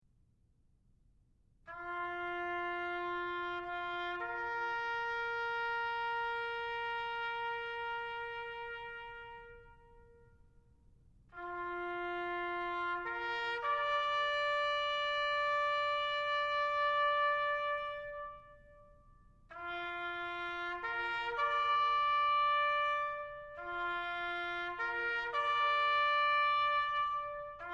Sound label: TAPS